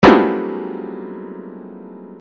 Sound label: chord lines simple; Midi Files 1000